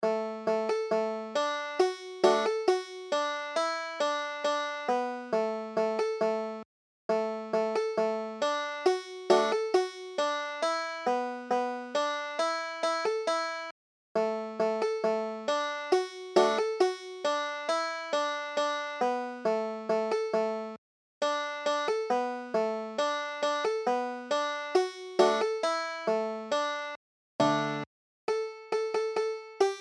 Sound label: banjo; banjo tramp